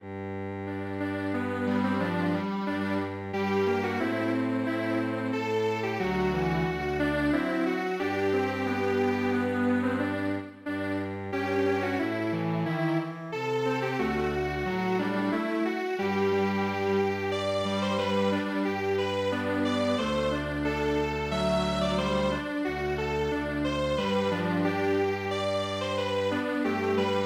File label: Strings Quartet; Emmentaler Lied